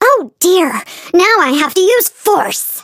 flea hurt

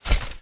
knight footstep

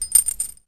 Dog select Land

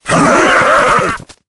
battle ram charge

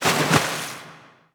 Dolphin splash; RA3

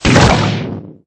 big skeleton hit

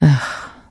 trader sigh, 商人杰恩; 商人杰恩（七日杀）

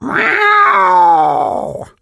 hurt vo; kit

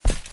knight footstep

